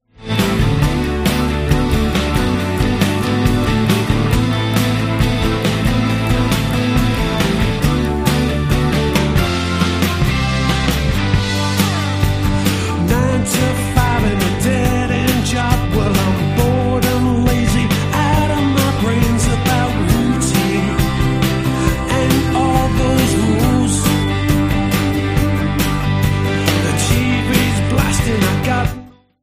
dangerous guitars

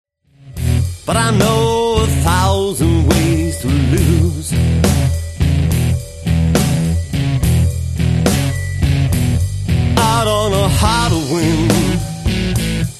low and lonely blues

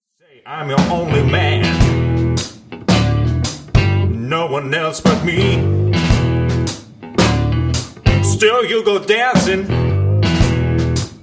blues

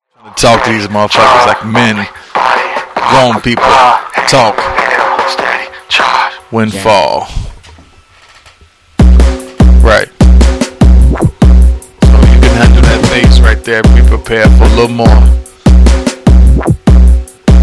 drums of war

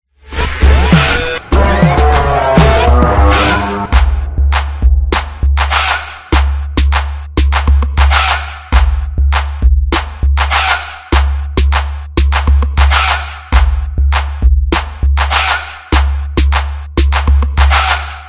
instrumental

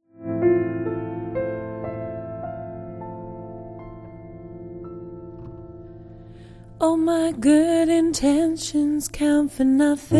piano vocal version

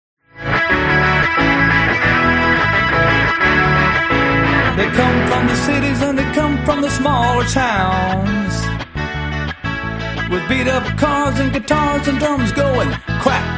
play along drums classic rock minus drums

